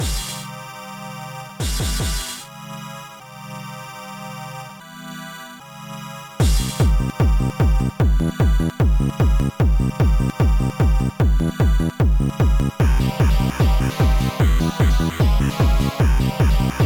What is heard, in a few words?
dance 2 da trance; C-Frog